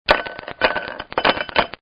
Ice Cubes In Glass; Drink Mixing; Bars

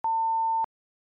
censor beep medium, Censor Beep, Medium; Medium - Length Electronic Beep To Censor Out Objectionable Words Or Phrases In Tv Broadca; Beeps; Multimedia

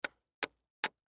Human Kiss, Kiss Triple Male Seven Yrs Boy; Kissing; Blastwave; Humans

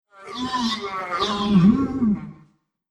Alien Silly Space Sickness; Sci-Fi; Andrew Fleming

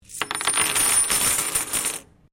Coin Penny Multiple Drop Wood; Common coins; Money; Objects

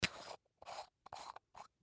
Make Up Unscrew Lid; Lids (Sound Effects:Objects); Blastwave; Foley

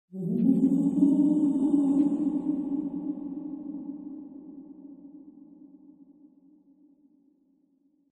Ghostly Moan; Ghost Sounds; Horror; Partners In Rhyme

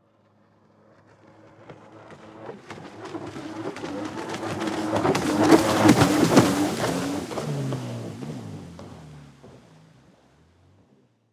Vehicle Jet Ski Pass By Fast Bounce; Boats; Vehicles

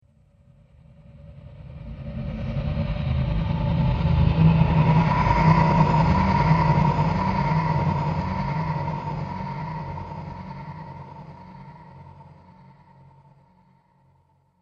Ghost Hunters Dark Spectre, Ghost Sound Effects

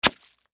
Food Chop Dice Juicy Impact Vegetable; Household; Foley; Blastwave

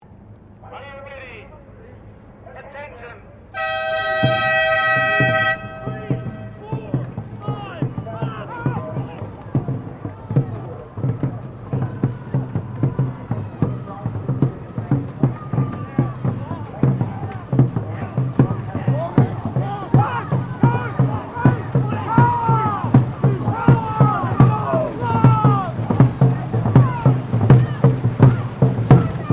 Boats Dragon Boat Race

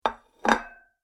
Medium Side Plate Rings When Placed On Surface; Dishware Sound Effects; Household Sound Effect

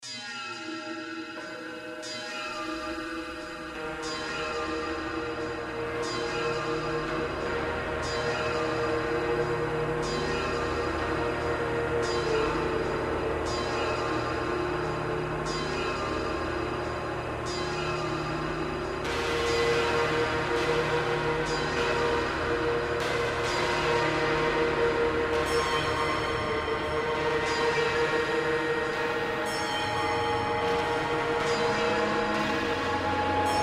Sci Fi Bed High Metallic Pulse Swirls

